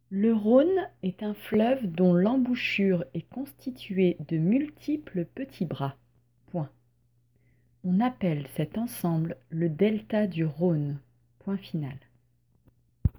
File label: Vocal